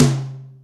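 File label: Ambo tom, Boss DR660, ht; drum machine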